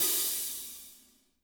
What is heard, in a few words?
HAT, WARM+FAT KIT; Spectrasonics - Burning Grooves CD2